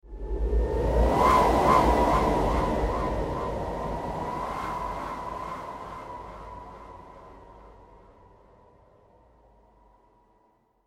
Evil Wind Gust Horror Vocal, Evil Wind Gust Vocal Scary Dark Ominous Demonic Whoosh; Ghosts